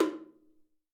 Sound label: Bongo high